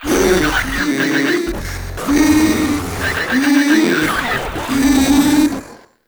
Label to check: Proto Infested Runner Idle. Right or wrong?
Right